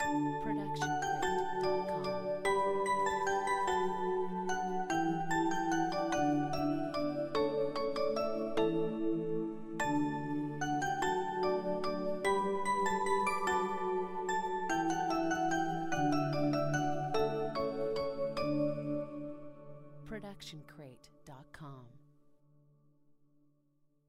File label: christmas is in town jingle; soundscrate